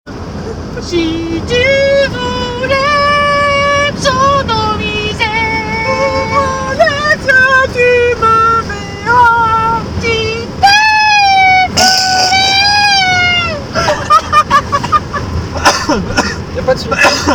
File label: Vocal